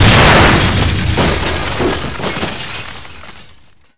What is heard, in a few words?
truck